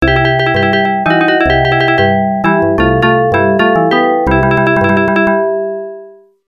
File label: Steel Drums